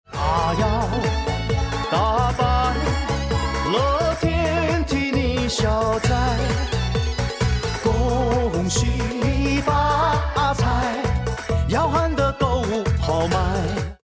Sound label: won round; music kits custom